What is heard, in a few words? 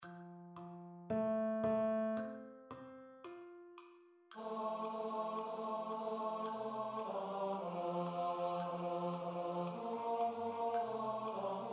TENOR STUDY